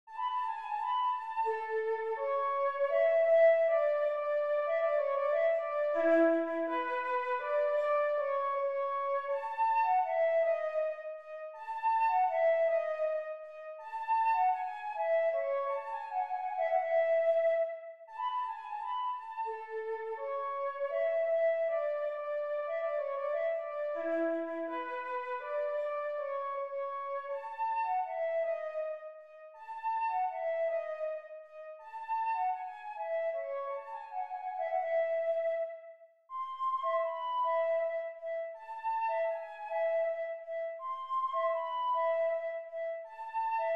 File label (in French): menuet quintette cordes; boccherini luigi